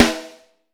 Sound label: RS, Snare Drum; Q Up Arts; Johnny.C's Vintage Snare Drum; 4X14 JCSM